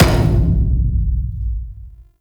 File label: Weapon Three Mech Hull B; Warframe; RailJack Weapons; Modular Weapons